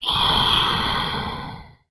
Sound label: scrm; The Elder Scrolls III - Morrowind